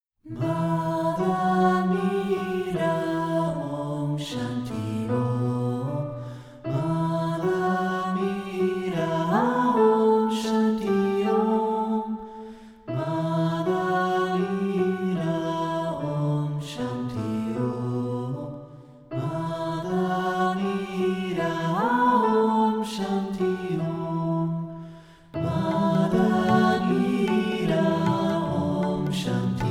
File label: Om Shanti; Mother Meera